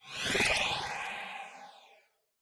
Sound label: wraith; smite; ahpuch skin10